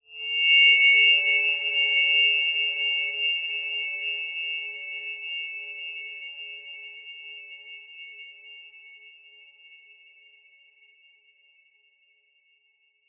horror whine shrill high pitched; zapsplat

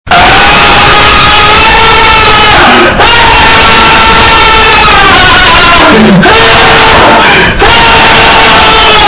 ear piercing

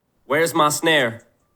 snare

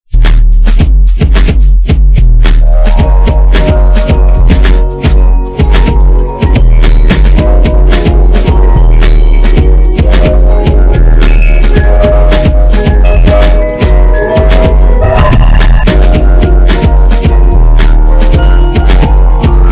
car drip

